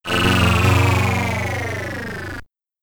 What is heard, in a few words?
Game Over (SID Stereo), Commodore 64; The Tube